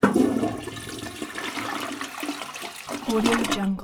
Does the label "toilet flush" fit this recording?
Yes